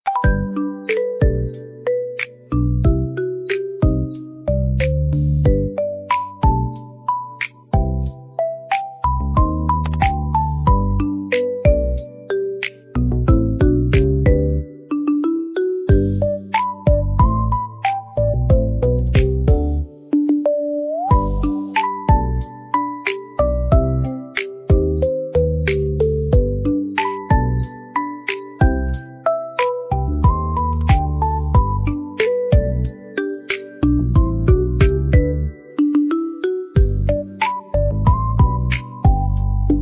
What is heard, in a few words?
bg music